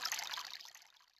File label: Stream, Yamaha DTXpress-II, FX